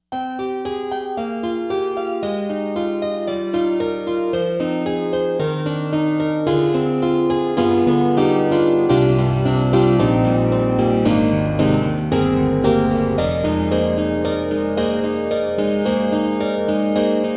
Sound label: Piano; Roland; SD-50; GM2; Piano 3